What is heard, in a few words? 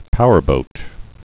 powerboat